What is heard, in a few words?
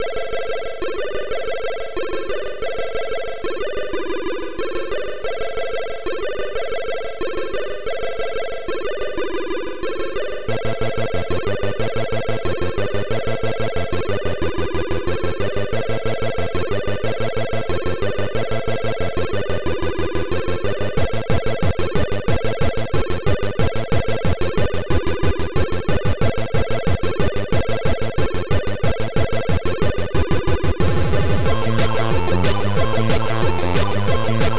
7 Channels Techno Punk; The New Style